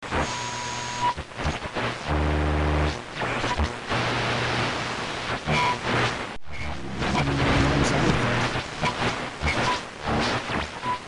noise; Gisou Shoujo to Kyokou no Machi; Android; scenario

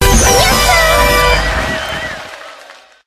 bubble levelup; game rip; line bubble

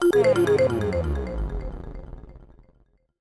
Hit (Fail); Google Be Internet Awesome World

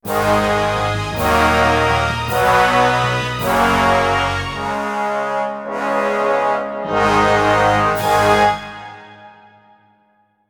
Victory; savage the battle for newerth linux macos windows gamerip 2003

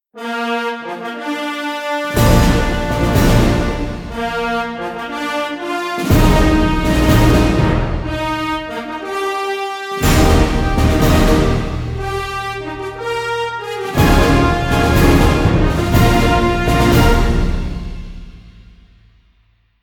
Fanfare Long

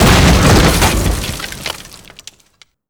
blast; pirates of the atlantic windows gamerip 2006

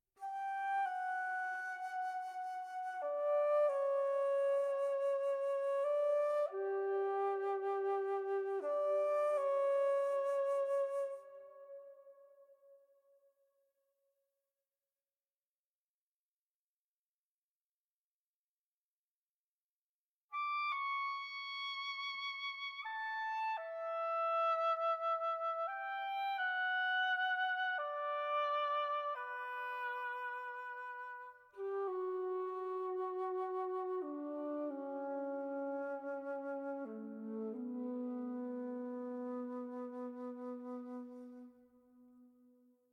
Menu Theme Darker Version (Woodwinds Stem); ps4 ps5 switch windows xbox one xbox series xs

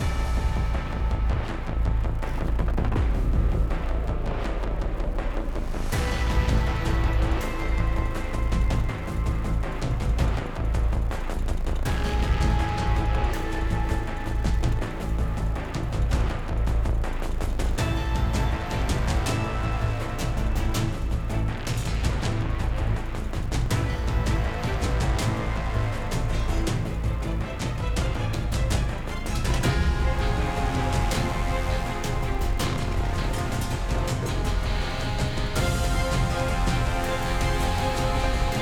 Battle Snow

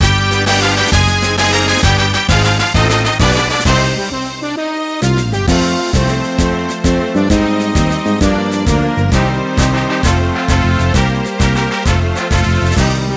Music Game General event

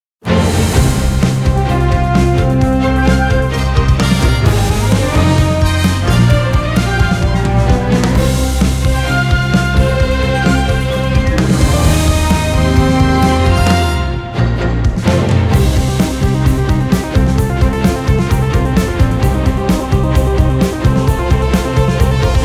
Combat Music 130BPM; ps4; xbox series xs; SM2

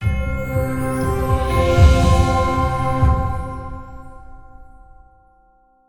Spell global; Worlds of Magic: Planar Conquest; PS4

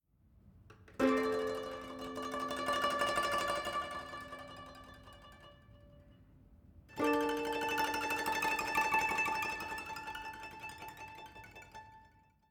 Savannah Music

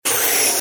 Exhale; F.A.M.P.S

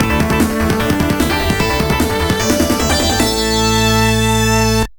Jingle - Zone Clear; Match Start From Atp Tour Championship Tennis; Metal Sonic Hyperdrive; genesis

